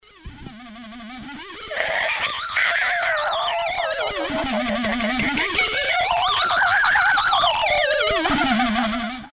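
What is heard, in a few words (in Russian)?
gjyn iz lampy, genie from the lamp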